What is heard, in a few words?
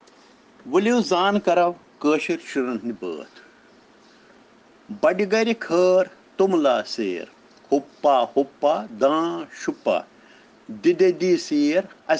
Children Rhyms in Kashmiri